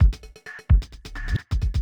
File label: electro kit 130